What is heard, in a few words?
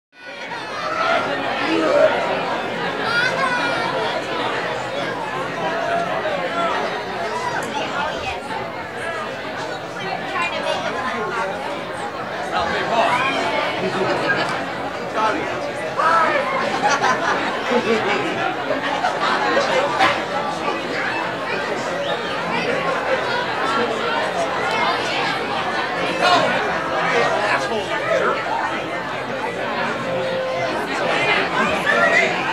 Interior Mixed American Crowd Happy Chatter